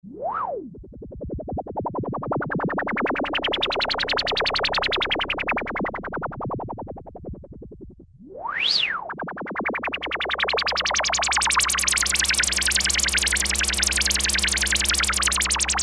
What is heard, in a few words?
Korg Poly-800, UFO Swoop